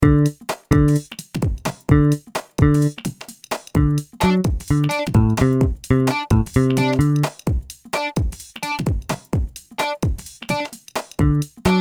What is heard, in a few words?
saxophone; mib